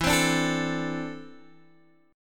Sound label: E 7th Suspended 4th Sharp 5th, Guitar, Open G